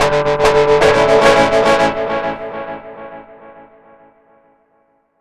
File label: FX DIST; FX DISTO